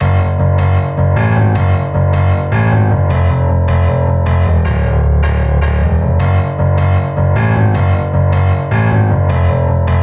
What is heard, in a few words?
Dubstep Remix